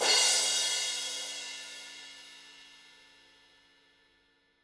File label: Crash Dry Session Soft, Cymbal; One Shots; Drums; Ableton Live 12 Lite; Core Library